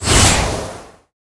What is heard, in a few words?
Airdrop Generate